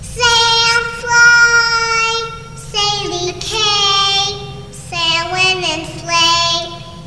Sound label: boo singing